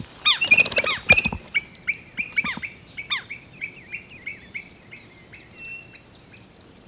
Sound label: nothura boraquira